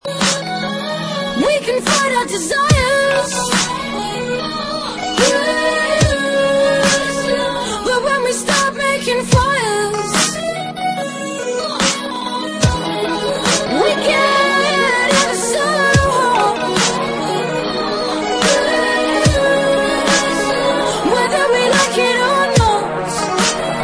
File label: dubstep